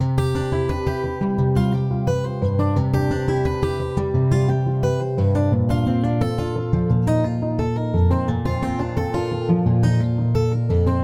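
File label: poly guitar major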